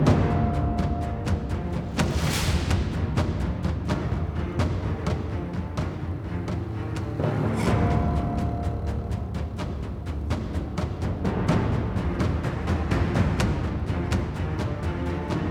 Default Loading Music; Battlefield 1

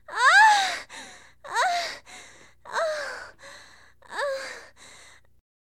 Sex Lines Orgasm; Hilzartov; Fairy Size Doesn't Matter

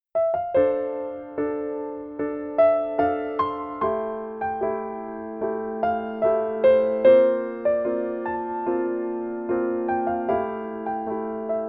Piano Minor Chord A; planetarian original soundtrack 2016

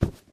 Pillow; doodle jump 2; android

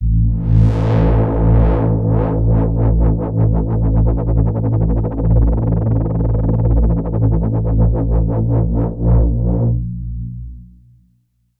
72bpm

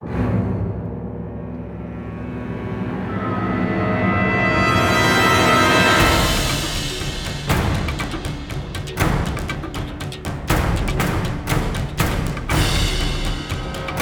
Harpies Destroy Bridge; The Witcher 3 Wild Hunt

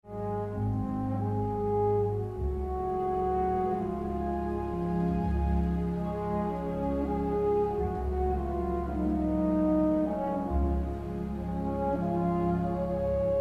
peaceful loop

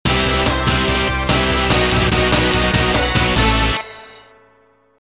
Metal Celebration; Blastdozer; Nintendo 64

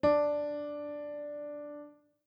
piano d1

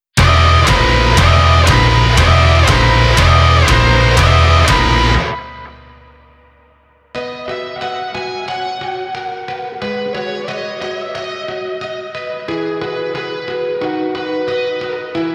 Level Clear Low; Lame Genie Presents Dr. Mario

